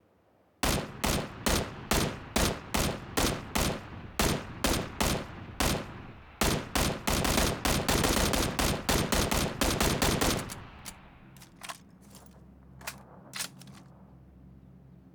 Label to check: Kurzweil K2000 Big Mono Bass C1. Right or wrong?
Wrong